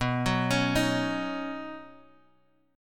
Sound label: B Major Add 9th, Guitar Drop D chord